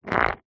foley stone scrape scratch mark concrete; zapsplat

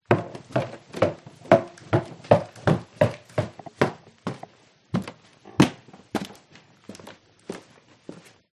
footsteps wooden stairs house

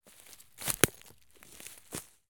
foley footstep twig snap forest floor; fork media

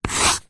office pencil draw line on paper; zapsplat

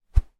warfare knife swish air slash movement; zapsplat

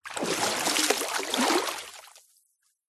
nature water med shallow movement slosh

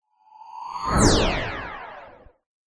cartoon anime whoosh rush power laser

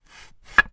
foley wooden slat movement slide clunk

